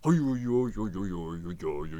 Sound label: Necromancer Attack Vocals